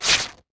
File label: Rip